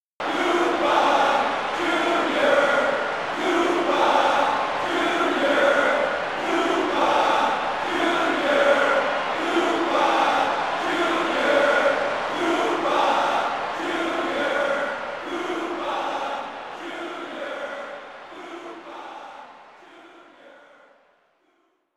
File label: Crowd Chant; mario strikers charged football wii gamerip 2007; Koopa Jr.; Bowser Jr. JP